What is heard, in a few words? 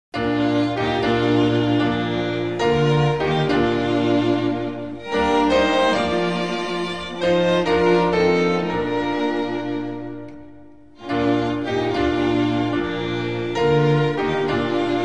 instrumental; hymns spanish